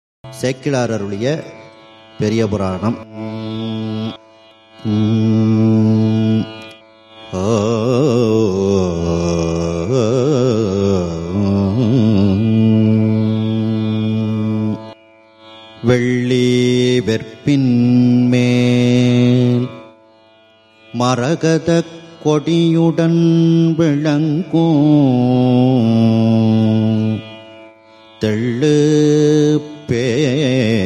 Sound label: thirumurai isai; velli verpinmel marakatha kodiudan